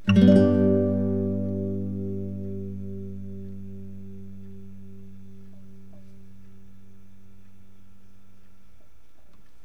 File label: switch